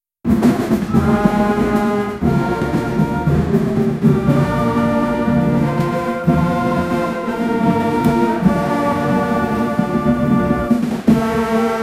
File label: a marching band of 1000